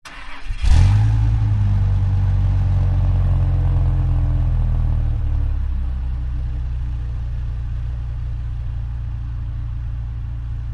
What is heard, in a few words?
Dodge Ram Start Idle